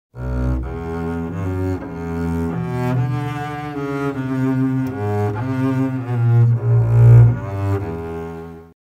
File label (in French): contrebasse